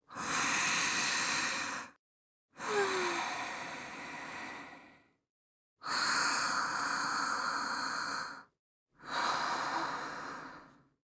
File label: girl suyasuya